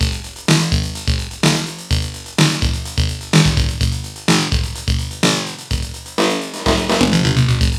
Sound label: LOOP, TECHNO; TECHNO-BOX; ELEC-LOOPS